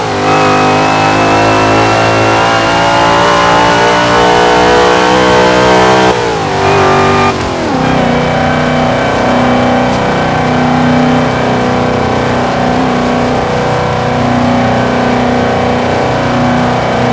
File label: fourth cruise, vehicles; gmod; tdmcars